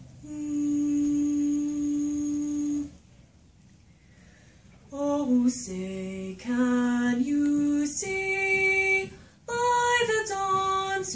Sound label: soprano 1st half